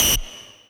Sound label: lead